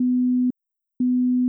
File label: Car Warnings; oviatt